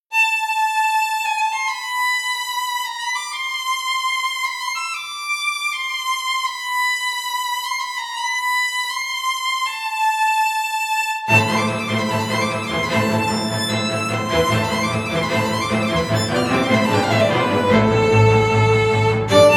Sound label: String Symphony